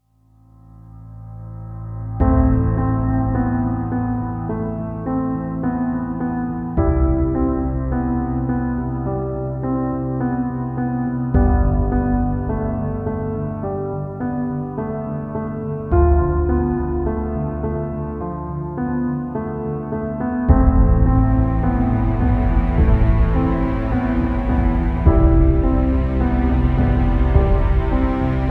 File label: dramatic moment